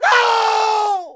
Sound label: Quake program error